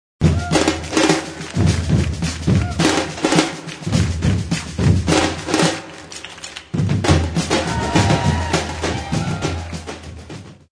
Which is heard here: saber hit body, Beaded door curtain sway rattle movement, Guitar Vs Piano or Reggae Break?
Reggae Break